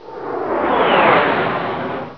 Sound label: air; lgeneral